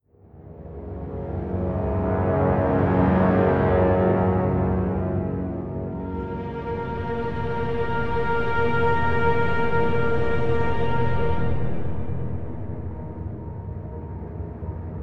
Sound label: Alone Suspense